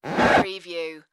creaky floor

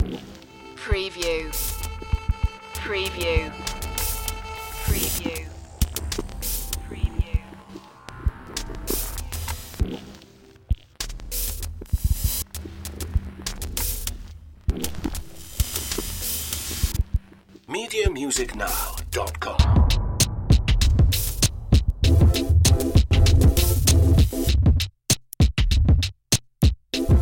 grinding loop

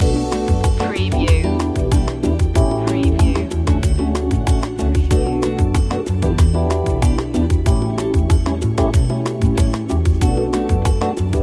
smooth bossa nova loop

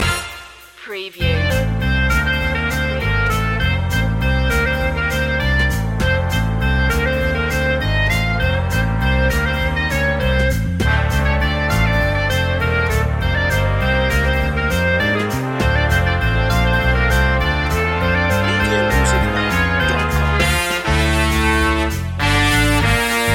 take the b road loop joiner

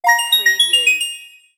Digital Chime Alert Melody

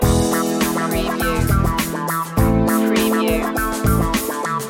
loop

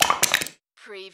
Button Sound Metal Can Crunch

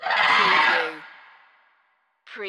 Dinosaur Screech

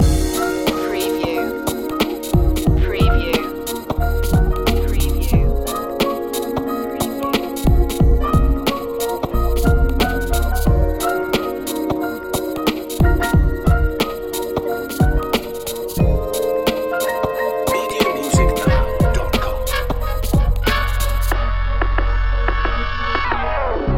smooth jazz funk loop

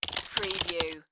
Computer Keyboard Typing Fast

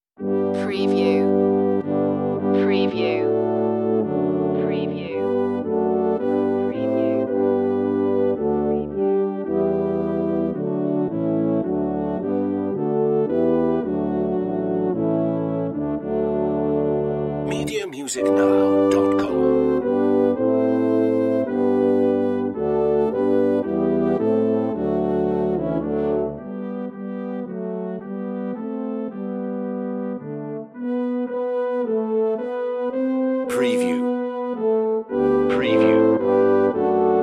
o come all ye faithful brass

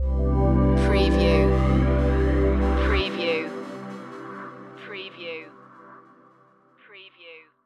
abyss stinger

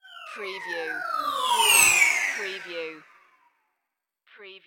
Descend Slide Whoosh

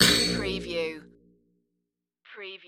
menu error notification

